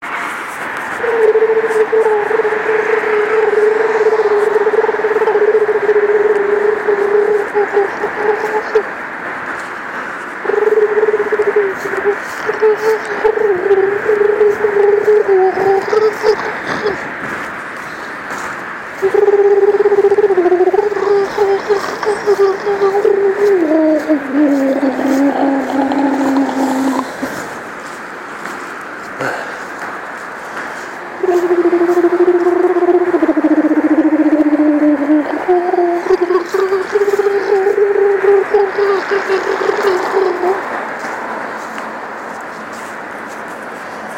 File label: vocal improv playing with ideas inspored by alarm